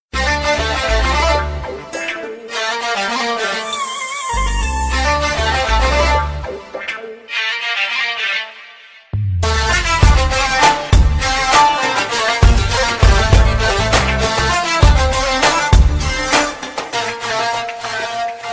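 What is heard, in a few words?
oriental beautiful music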